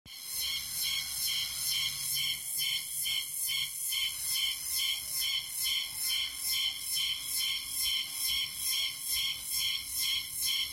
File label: Daytime noise